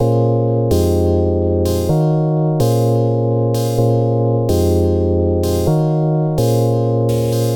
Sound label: 127 Bpm Funky House Chord